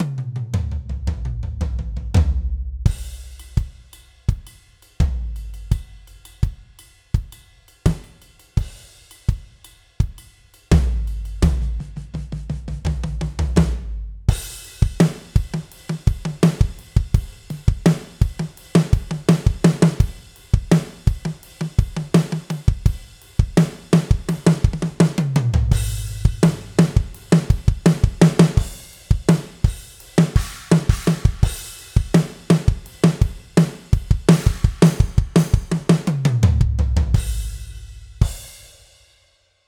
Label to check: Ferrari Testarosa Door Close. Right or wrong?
Wrong